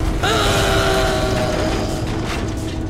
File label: falling scream; syphon filter 1; gabriel logan